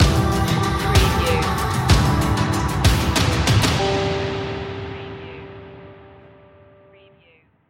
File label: dancing wind stinger